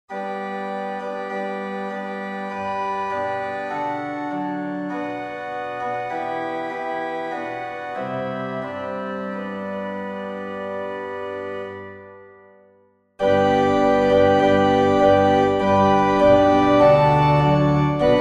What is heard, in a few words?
Organ